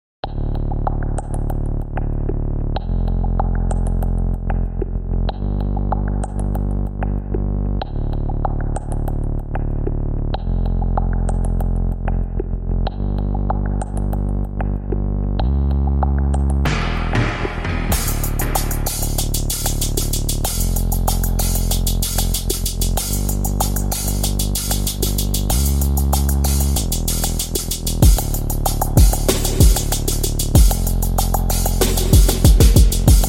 drums bass

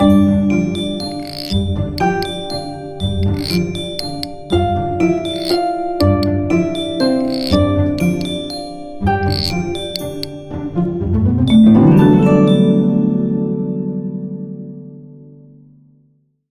christmas star

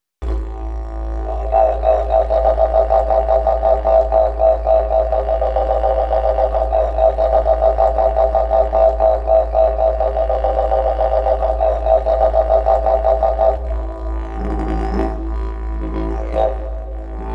didgeridoo complain didjeridoo

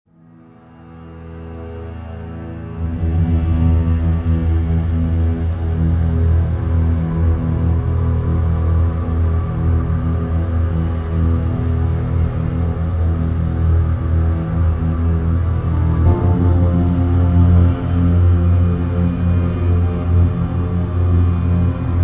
desert sand Ambiance

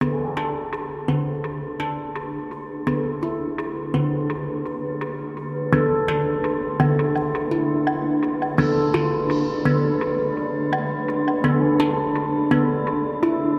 mystic waterphone

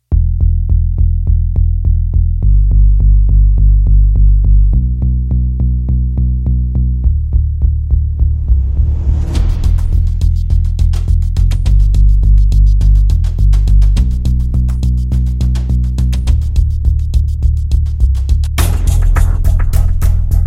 bass drums

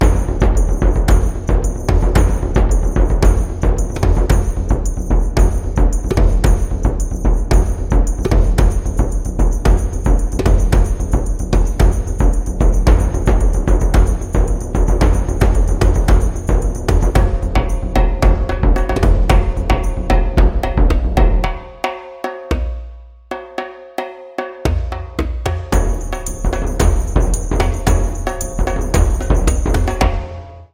tamtam shake zulu

